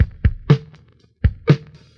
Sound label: needs more cowbell, Drum loops Boombap